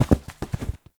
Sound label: Foam Block Impact; Warframe; Ambience; NineteenNinetyNine